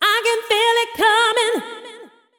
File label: deep house female, 125, A, vocals